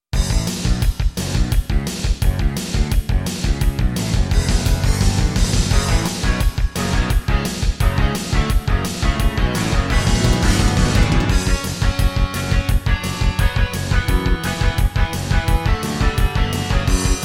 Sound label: Intro, BCM DB2133a; Duke Nukem 3D